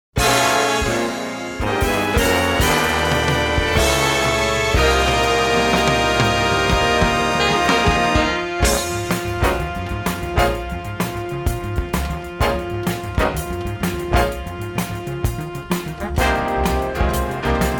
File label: blues, jazz